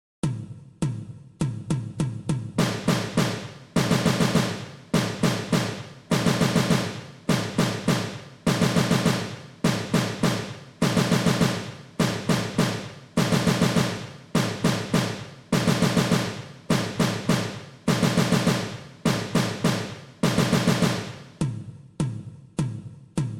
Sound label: Taps - Diddles @ 102 bpm, percussion; percussion methods